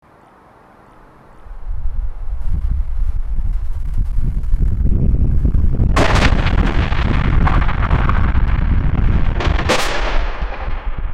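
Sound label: big skyscraper falling crash; disasters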